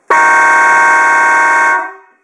son klaxon duo 12v trompe ensemble; klaxon mdk 406 duo 12 v

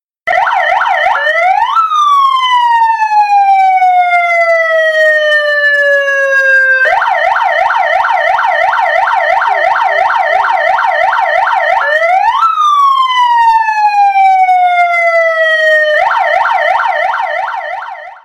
son sirene americaine, klaxon

